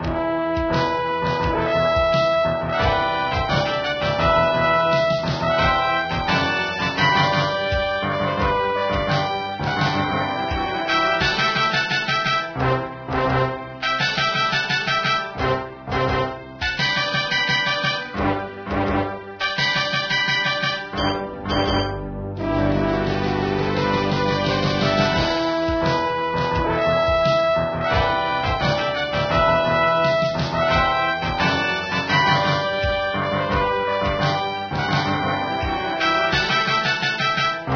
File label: victory; race-into-space